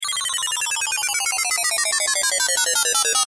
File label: Video Game Over Vintage Beeps Chime; Games; Technology; Blastwave